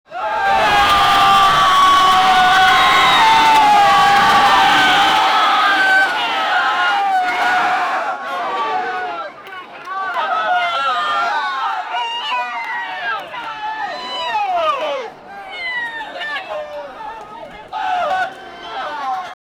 large crowd of people han; chaos

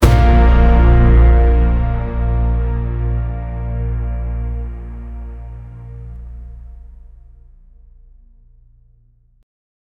cinematic string hit

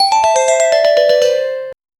merge 3 mobile game combo, melodic

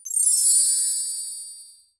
a magical golden shimmer

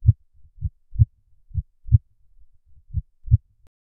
stylized sound of rapid, abstract

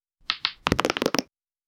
the sound of candy poppin

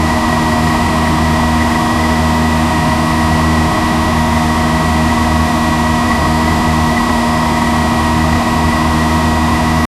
clean medium rev engine o, land cruiser

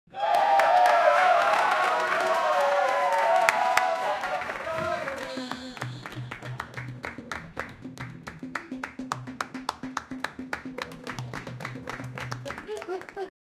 spanish flamenco music, applause

